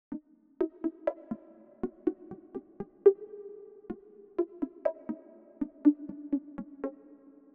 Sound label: grumble flick; Loops; Electronic